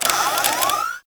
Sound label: Security Camera Start; Ambience; Gameplay; Warframe; Grineer; Fortress